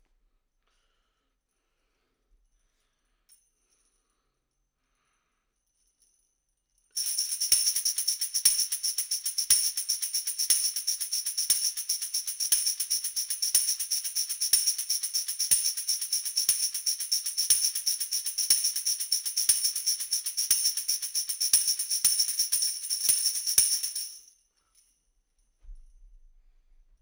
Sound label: Tamb